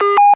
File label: Blurp Hi, Hard & Loud Techno; USB Soundscan vol.01; AKAI